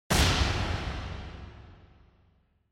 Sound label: Motion Slam Reverb